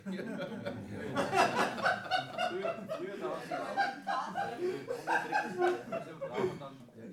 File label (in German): Lachen